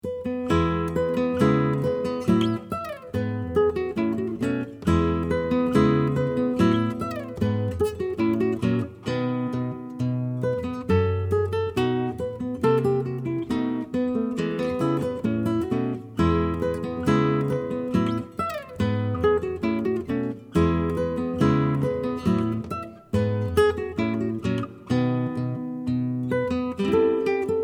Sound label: English Prelude